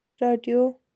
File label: radio